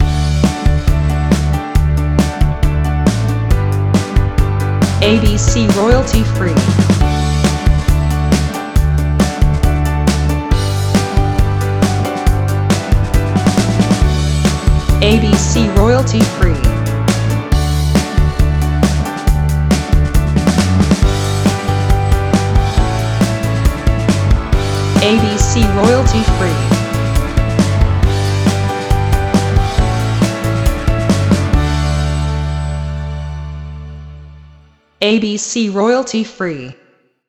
Upbeat Piano Pop

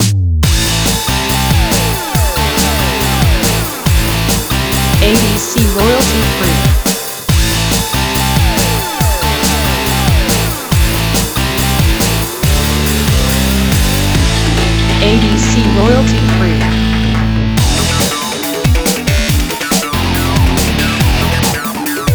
Energy Synth

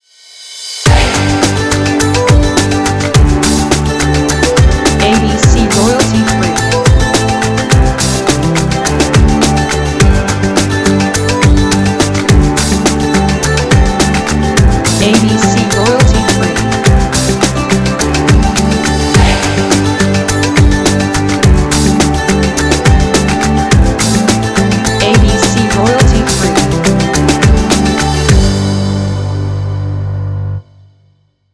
Acoustic pop

